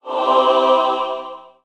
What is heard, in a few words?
Pickup Holy Cross; Pickups